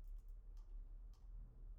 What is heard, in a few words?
mouse; IEEE AASP